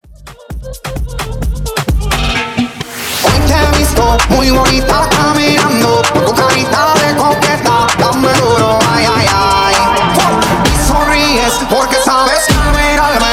Tribal 130 Bpm; Mash Up

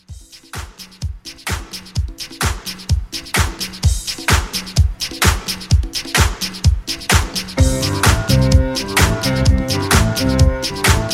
60s Re Drum 128

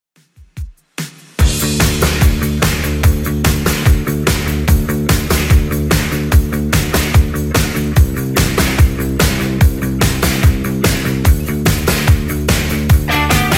80s Redrum 146bpm

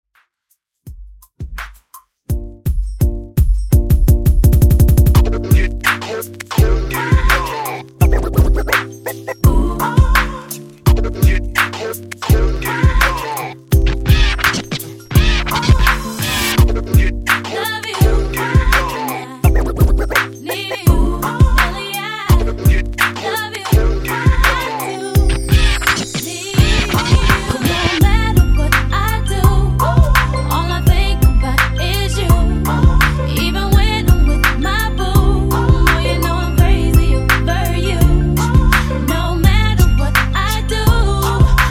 84 Bpm; Dj Intro Outro

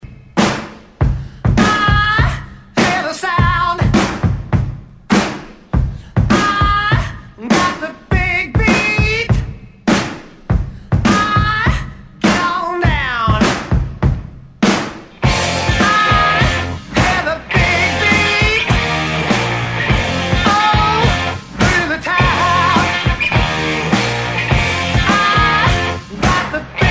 Rock Extended 101 Bpm; DJDOLLS

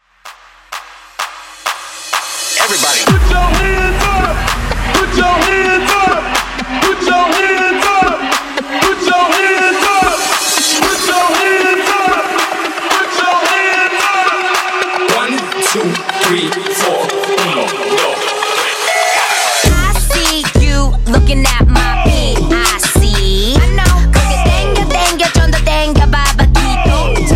Transition 128 100

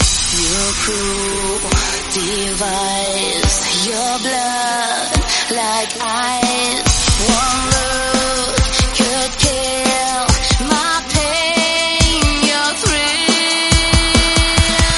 140bpm Em

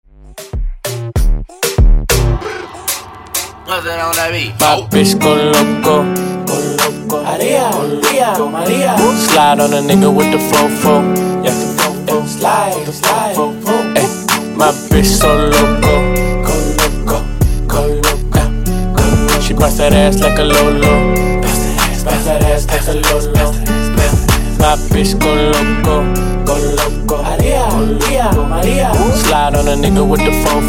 Transition 96 105 Bpm

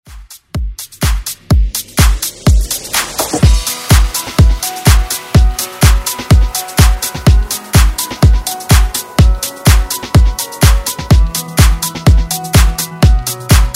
House Redrum

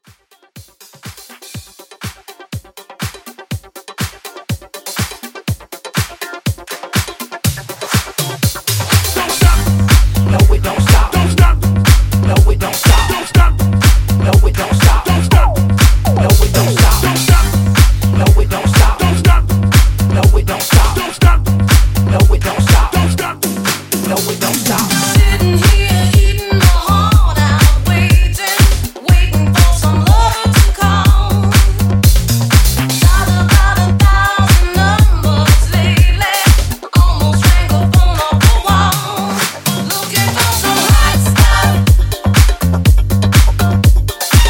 Hype 122

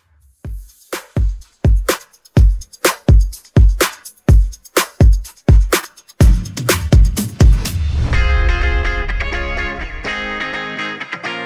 125 Bpm

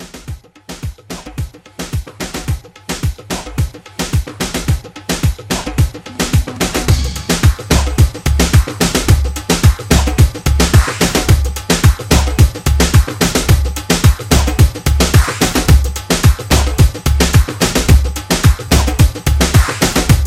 Moomba Hybrid Re Drum

